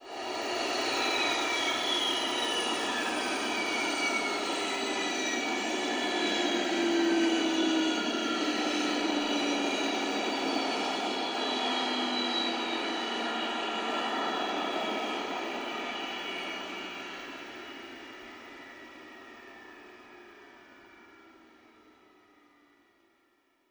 Aircrafts F-15 Cockpit Engine Shutdown In; DCS

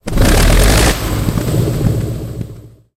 sherman m4a1 engine load, tanks; engines; War Thunder

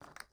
ui pickup bone; Rust

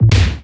damage hits explosions; World Of Warships

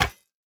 bear trap deploy; Rust

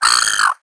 seagull; World of Tanks Blitz; ambient milbase

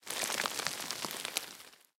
bullet dirt debris

